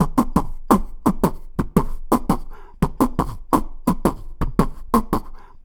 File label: Beat Box; Beat Components